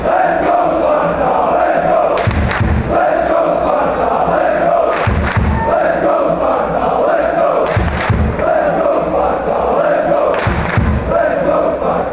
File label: lets go sparta, fanchants